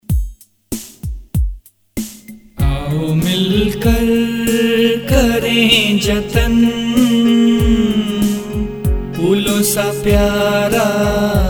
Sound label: Vocal Ringtone